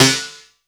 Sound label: SD, 160 BPM; Ueberschall; Drum & Bass Flash Trackz